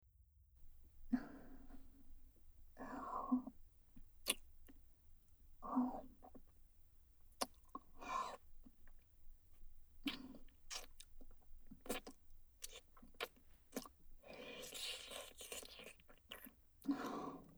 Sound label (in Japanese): フェラループトラック, ASMR; フォーリーサウンド; 他人に興味ゼロな美少女クラスメイトが『事務的妊活パートナー』に選ばれた話